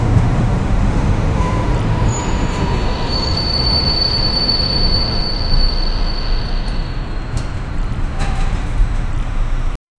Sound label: rails